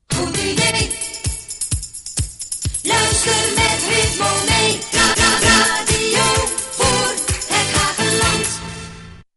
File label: jingle; Ritmo